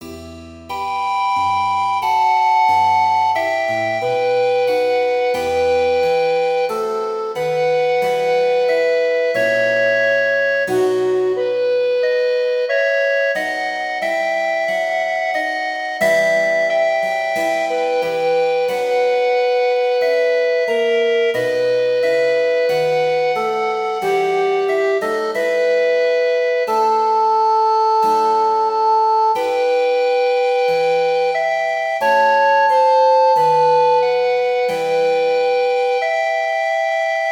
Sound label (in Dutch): Buxtehude Klaglied zonder zang; Dietrich